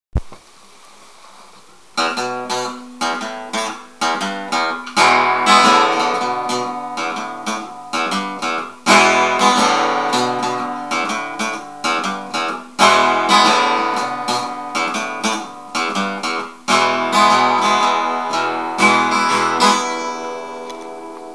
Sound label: spider riff